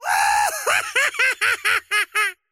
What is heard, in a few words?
wheel laugh; dota; largo